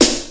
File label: airy sizzle steel snare drum sound a sharp key